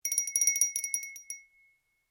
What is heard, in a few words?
Bell Dinner Bell China Ring, tiny dinner bells; Musical